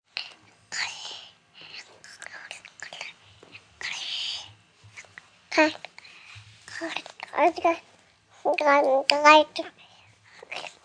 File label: Baby Soft Coos, Baby sound, 1 yr., cooing, whisper, long, quiet, human voice, kid, child; Talking Sound Effects; infant